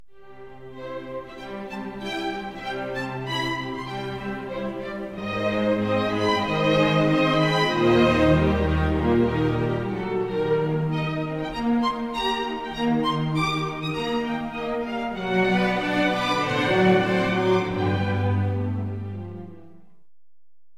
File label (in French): quatuor a cordes; Pierre